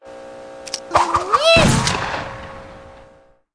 win; space wars

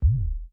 explode pre animation; serengeti kings

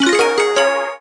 super meter joker win; mega joker

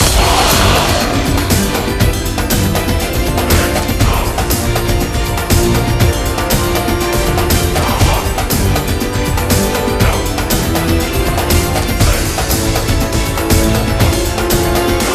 big Win Start; jungle spirit

